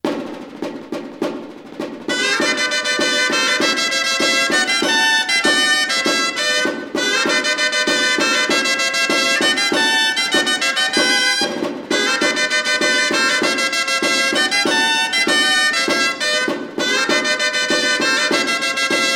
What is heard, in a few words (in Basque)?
Polka Dultzaina